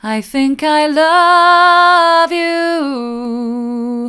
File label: mid, vocal